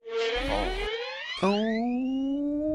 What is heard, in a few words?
slidin up ooohh